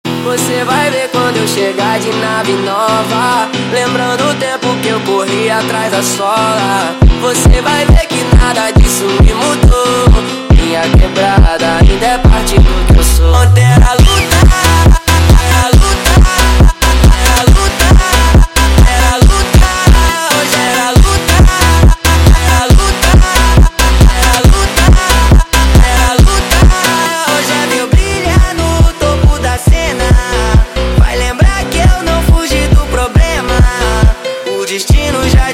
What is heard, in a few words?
Montagem sped Up